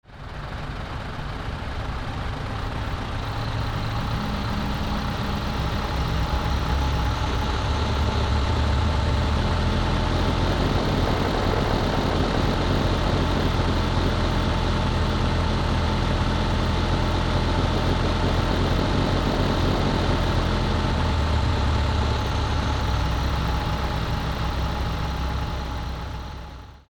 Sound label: Truck acceleration to steady speed